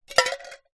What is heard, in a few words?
tin can lid inside empty tin shake; foley